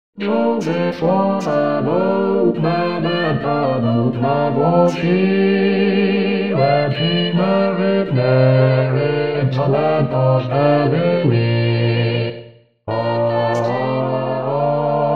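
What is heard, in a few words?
cherry tree Bass 1; Cherry Tree Carol; xmas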